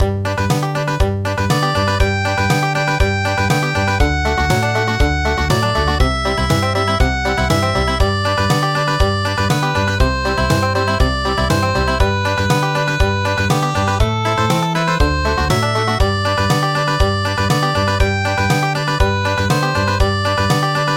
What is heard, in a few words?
Country; Dondong opo salak